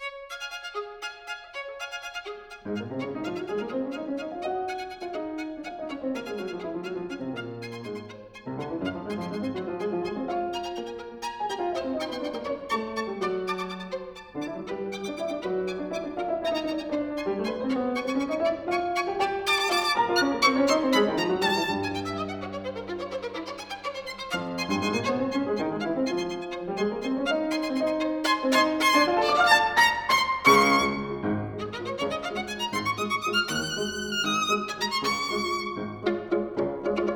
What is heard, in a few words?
Preludes for piano, Prelude in D